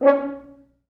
4 FrHorns

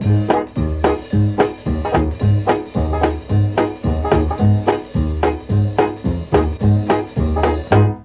G#1 BONE, Mellotron, TRMBN; Mike Pinder - Mellotron Archive CD; DIXIE+TRMBN

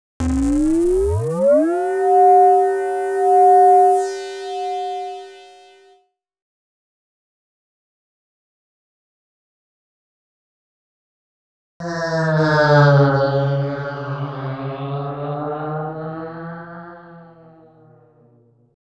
Special FX Ahh + Voice; FX & Atmo; Klaus Schulze Collection

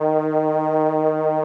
SOLINA, String Machines

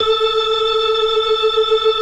FAST VIB, TREMELO+VIB, Synth and Organ; InVision Interactive; Keith Emerson

